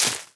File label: block grass; CubeGame